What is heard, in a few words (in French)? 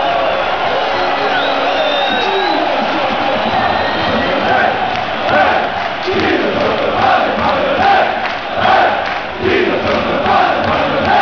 Qui ne saute pas n'est pas lyonnais!, Chants